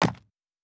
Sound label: Rock Impact; Survival Game Sounds - Lite Edition; Environment